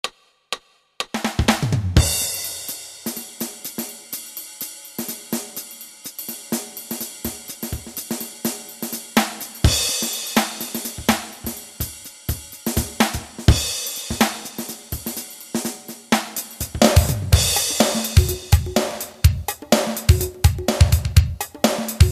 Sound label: Rock Jazz